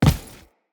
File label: Crew Footstep Default